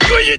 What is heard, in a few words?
got hit; Digital Paintball 2